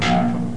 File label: LOW TWNG